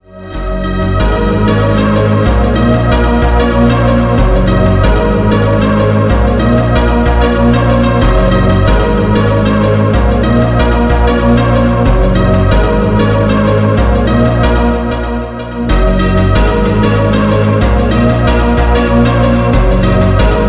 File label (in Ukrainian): spokiinyi fonk